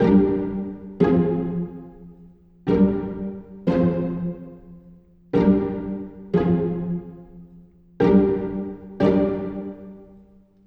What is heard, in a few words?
strings Pizzicato Hip Hop 90bpm G minor; hh strings